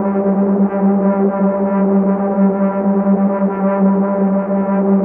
DRONE, Pads; Distored Reality 1&2

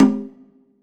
SR LL CONGA, Drum Mashines, SR-16 DRUMS; EdgeSounds

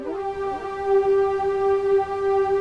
PADS; Pro-Rec - Synthsonic; Z1 PADS

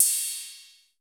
cymbal, Roland MC-909

